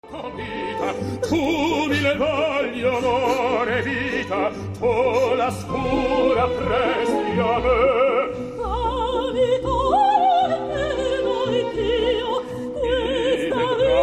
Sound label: duet